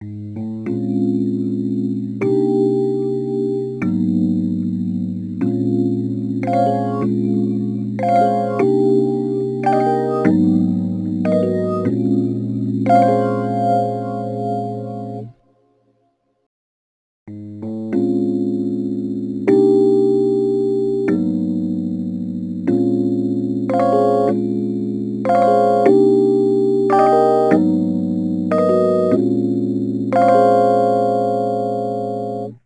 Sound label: Rhodes Attacks; Ueberschall